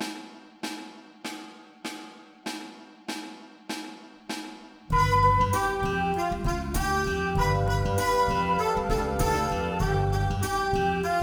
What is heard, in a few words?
Recorder; Learning Speed